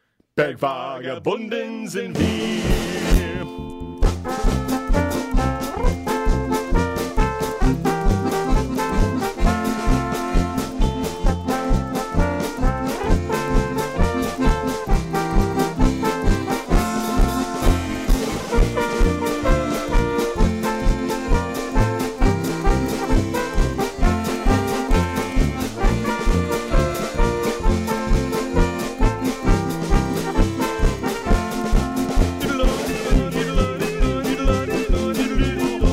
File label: German Band; Washington DC